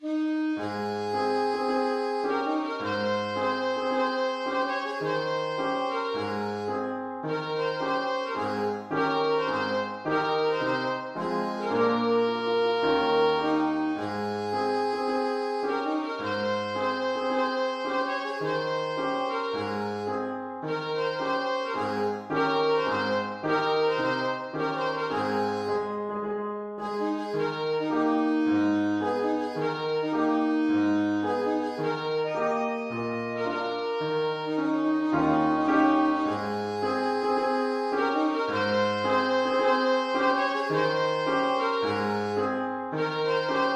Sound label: triumphal march alto sax